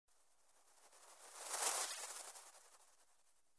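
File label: noisy sound of wings of large butterfly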